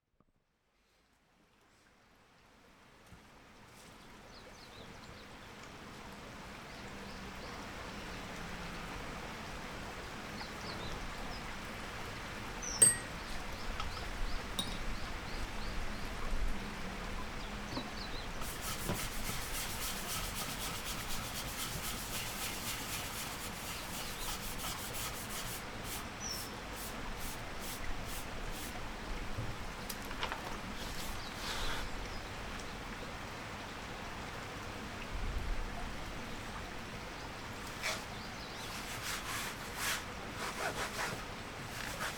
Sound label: soundscape